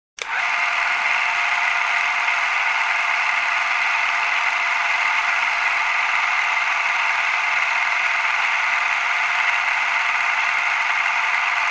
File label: Camera Projector Rolling